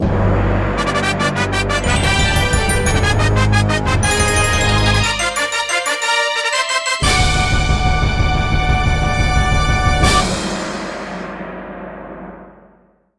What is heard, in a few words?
Bowser Defeated; NSMBW